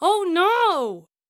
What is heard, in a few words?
Angry